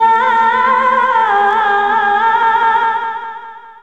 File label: oriental